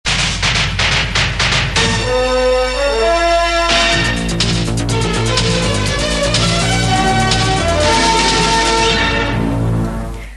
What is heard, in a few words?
jingle; fazit; tele5; tv total